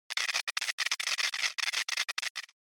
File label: Electric Shock Long